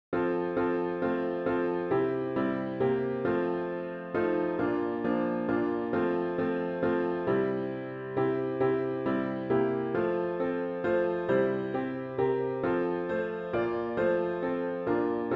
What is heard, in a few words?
piano only